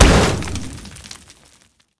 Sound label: armordillo powerball oh; rammus